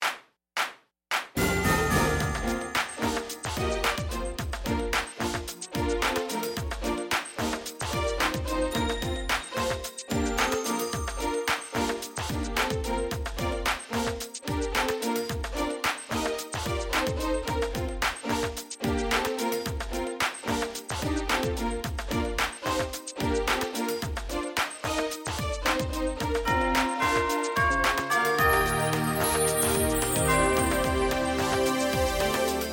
piano electric bass and drum play along instrumental accompaniment